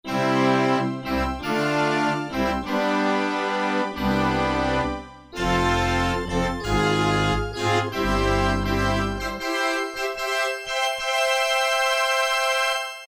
SOFT ORCH, Casio VZ-10M; VZ ROM Card

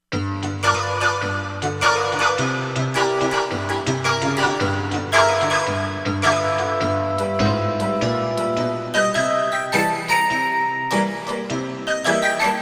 Nail Piano, Korg MSC-16S; Environment

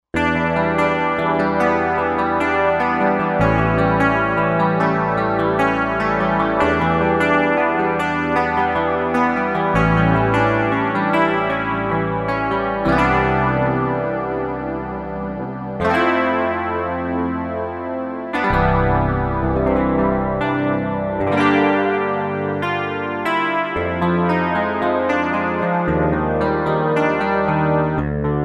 Guitar Rez, Korg Wavestation A-D

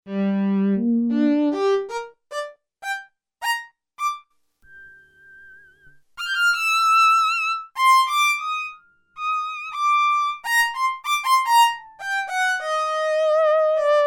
SOLO VIOLIN, Korg DW-8000; Factory Patches

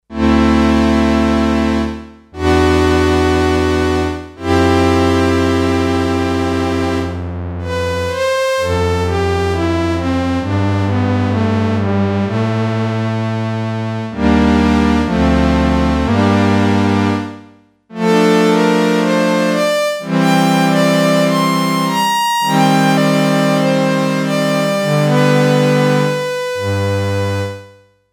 Strings, Sequential Circuits Six-Trak; 52 Marlboro Strings; Factory Programs

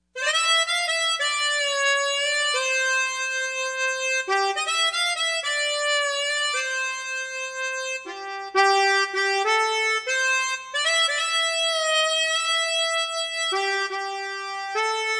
Metal Reed, Yamaha TG55; Factory Presets

